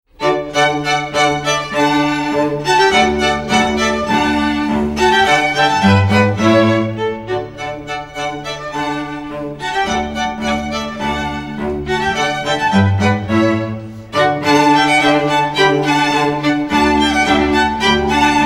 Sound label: spring, Classical Trio